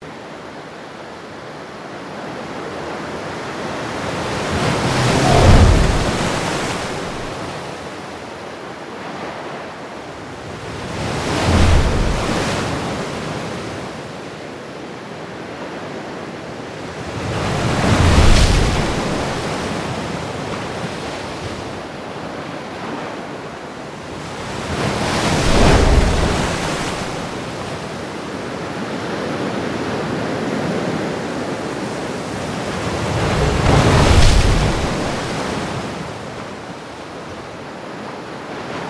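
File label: Water Wave Splash Seawall Loop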